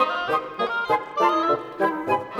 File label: Winds, Orchestral